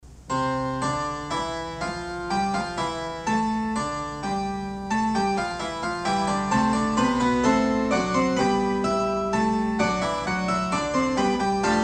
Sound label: fugue; bio js bach